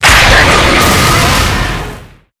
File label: Grunt Die; netent